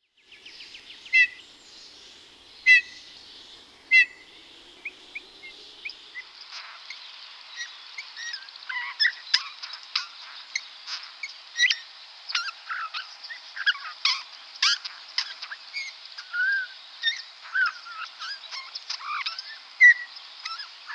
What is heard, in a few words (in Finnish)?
Punatulkku; lintulaudalla; janerik bruun